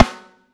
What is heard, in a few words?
Snare, Drum & Bass